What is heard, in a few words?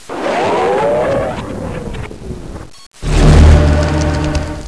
particle suck; ambience